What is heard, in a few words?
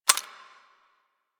Rifle Mech; Bard